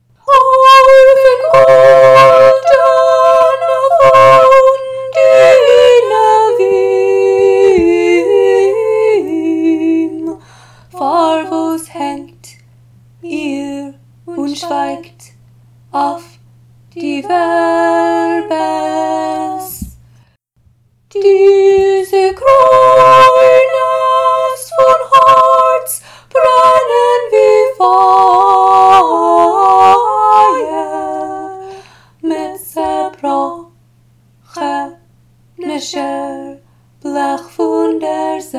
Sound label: soprano; Va Pensiero